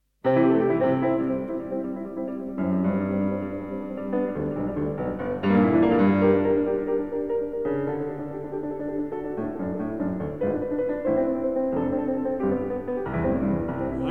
piano; Franz Schubert: Die schöne Müllerin

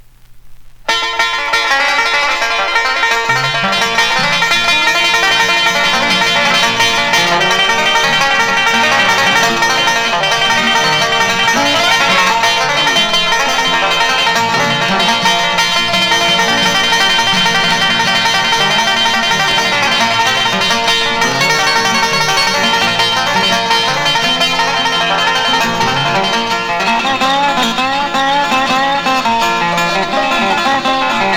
banjo

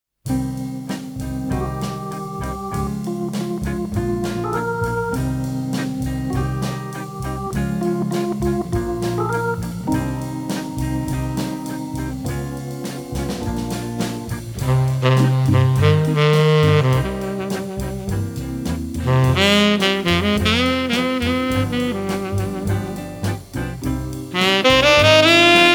quintet

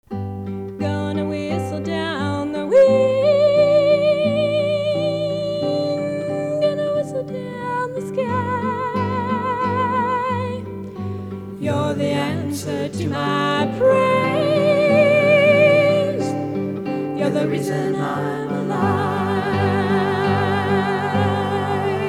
vocal

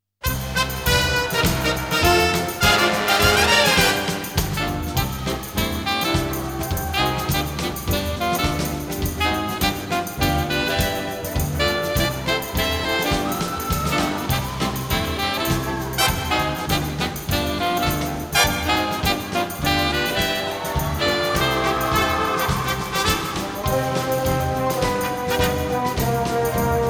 samba; Сборник танцевальной музыки

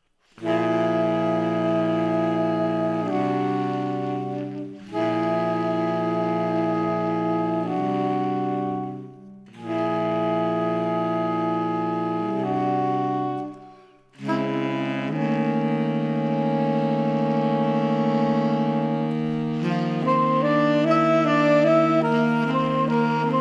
saxophone quartet

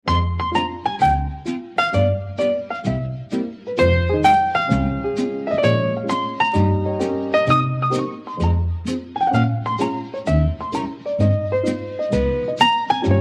skr piano

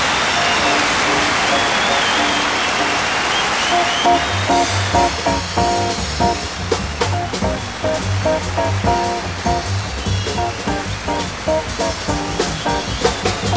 fanfare

